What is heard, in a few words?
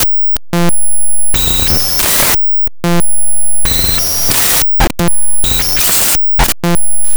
Rhythm